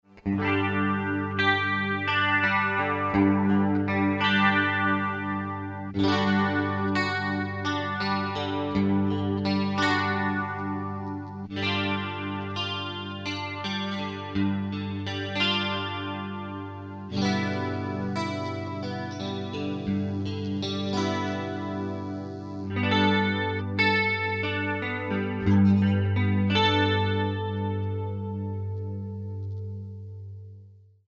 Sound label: CLEAN Fender Musicmaster